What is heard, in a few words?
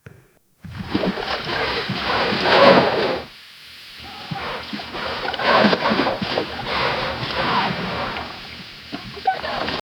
farmer gasping raft creaki